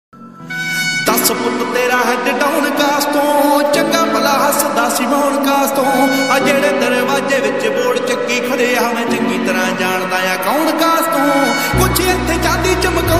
song ringtone punjabi song ringtone punjabi ringtone